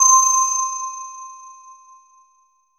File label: BELLS C5, synth waves; Music Store Sound Service; Einstein